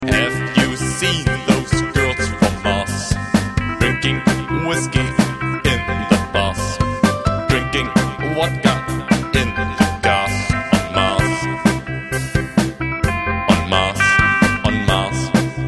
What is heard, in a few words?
blues